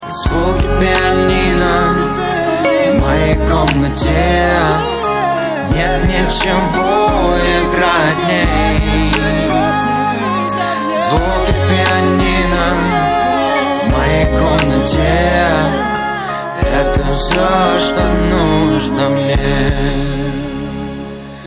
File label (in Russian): zvuki piani; Отечественные рингтоны